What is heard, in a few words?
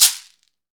CAXECERE, Latin Shakers